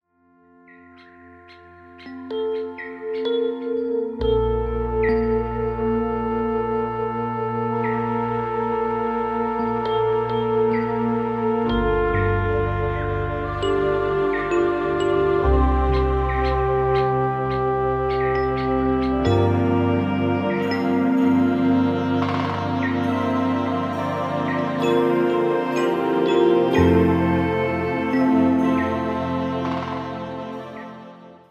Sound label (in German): Instrumentalmusik; musique instrumental